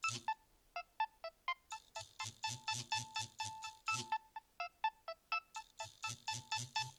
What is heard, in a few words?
nokia 6100 ringtones avenue